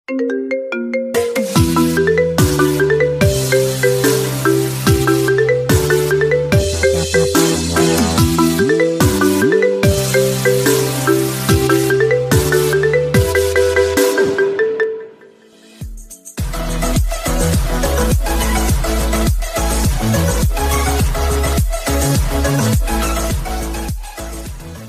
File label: remix ring beatbox; iphone 8 plus; ios; new iphone 8 plus; iphone ringtone